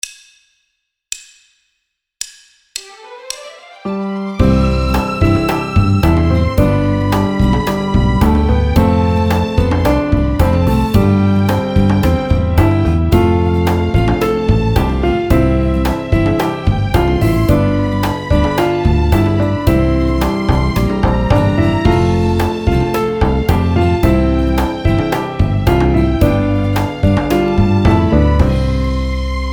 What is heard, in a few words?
Piano Accompaniment; Geylang si paku Geylang